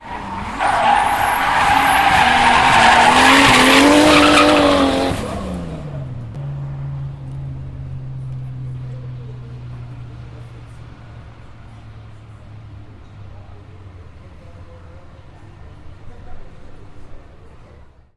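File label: sounds of a car drift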